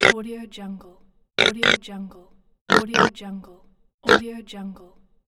pig

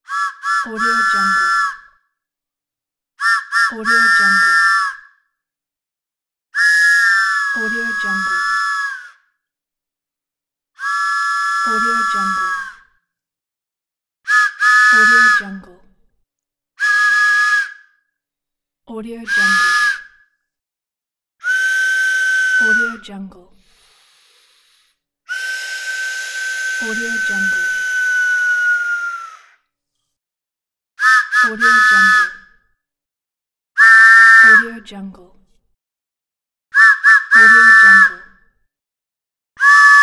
cartoon locomotive whistle

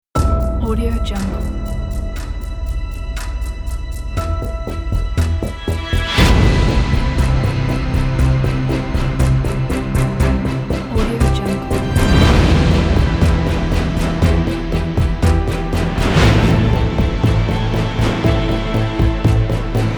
tension thriller

